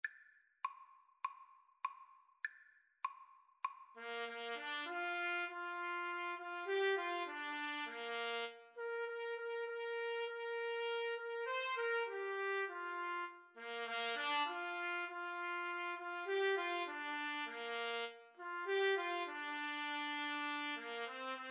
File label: trumpet duet